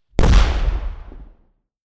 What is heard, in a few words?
large explosion